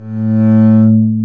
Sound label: STR DB HRM, Chamber Harm; String Master Series; Combos 4